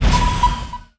Zombie

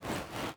swing; sky dragons fury

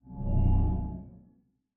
wither beast aura pulse

